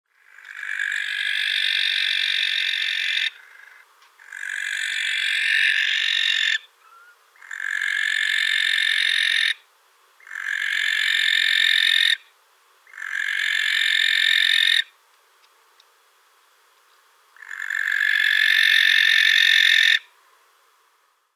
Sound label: Tyto alba